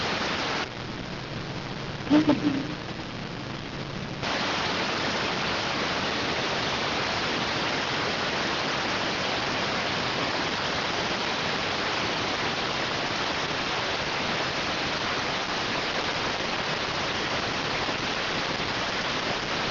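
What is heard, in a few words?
radioteletype receive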